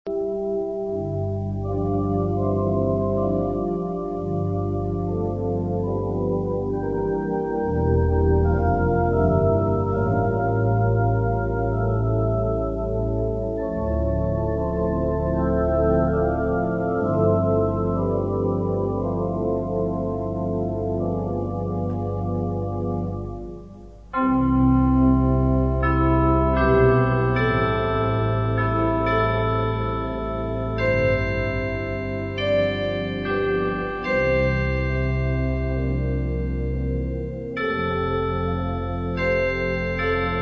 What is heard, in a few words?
witn chimes, Patriotic Songs